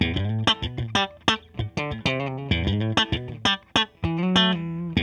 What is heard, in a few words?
POP LICK, Funk Gtr & Wah; PlatinumPhattCD2; E-MU Producer Series Vol. 8 – Platinum Phatt